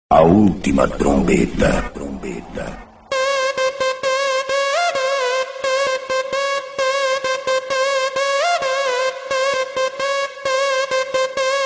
gospel mix

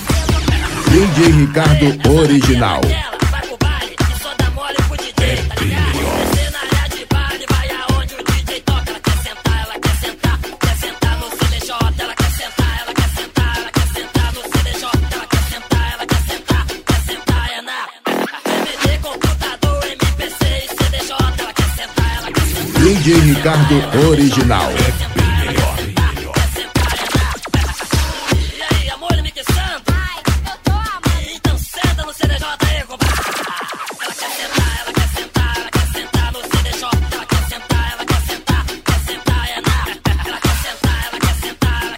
pancada mix

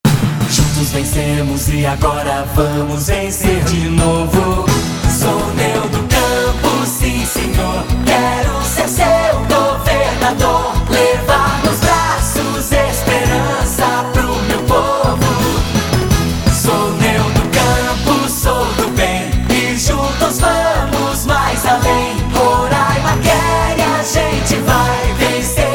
jingle neudo rock

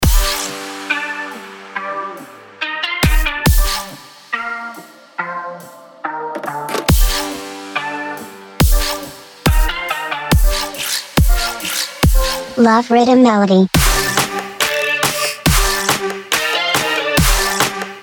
love riddim melody

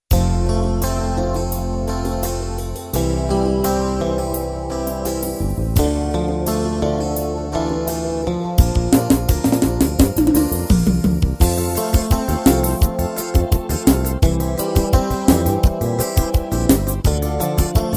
forro romantico

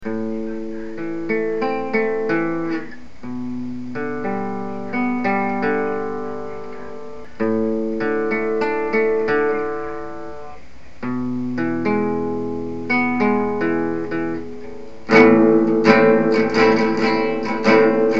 the demon march musica instrumental

